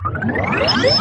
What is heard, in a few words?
Teleport Out